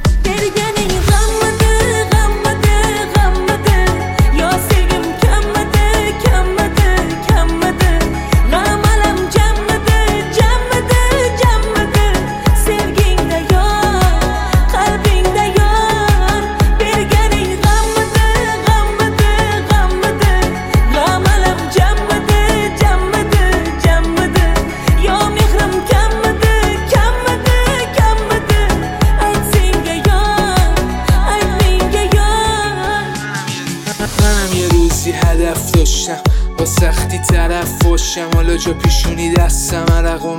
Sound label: rap